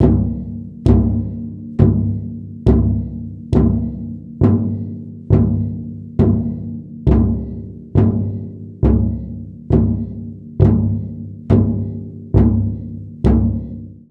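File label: Big Drum felt mallet; Sneak Up (Gittantmeah)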